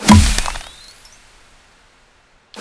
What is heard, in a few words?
Shoot